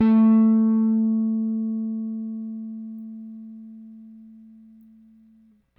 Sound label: RickThumb-B, RickenbackerBass; Airwindows